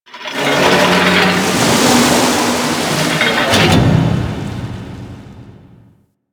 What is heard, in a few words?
Ocean Hatch Large; Warframe; Grineer Ocean